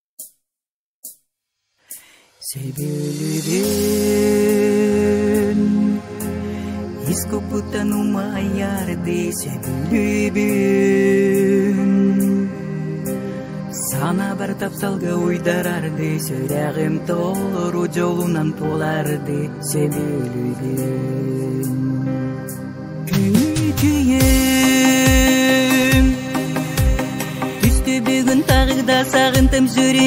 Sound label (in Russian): казахская песня